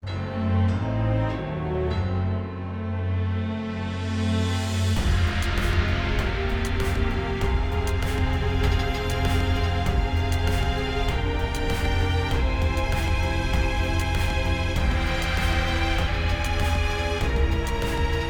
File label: Villain Win and Escape